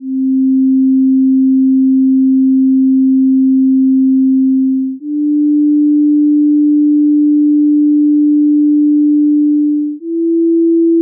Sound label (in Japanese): 正弦波1本による音階; 付録D：音階・音律; 各種音律